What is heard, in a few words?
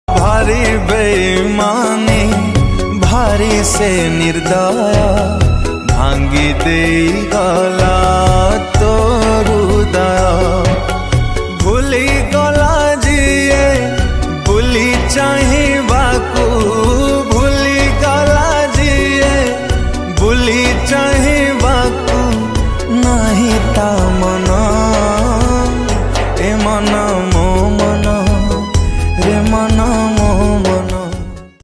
Odia Sad